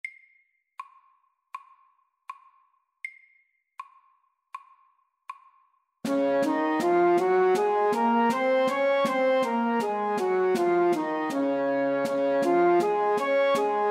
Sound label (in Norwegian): Dess dur natura MIDDELS